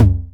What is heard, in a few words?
909 Tom; EMU Orbit-3